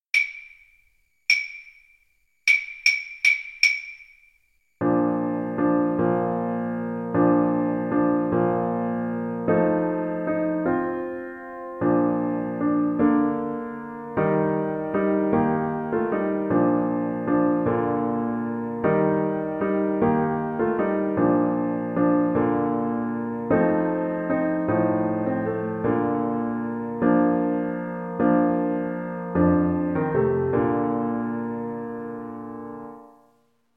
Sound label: horn, French Horn